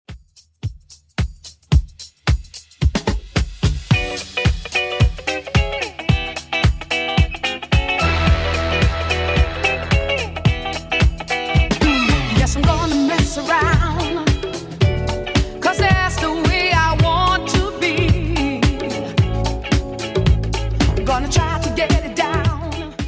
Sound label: 70s Redrum, 110 bpm